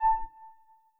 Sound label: button over